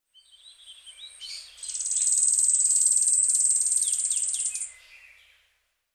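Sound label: Asian stubtail, hyogo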